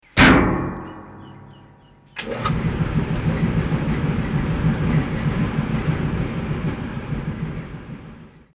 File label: Clothes Dryer; This sound effect was recorded in a custom built sound booth